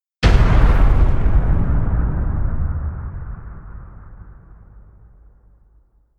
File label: destruction slams implode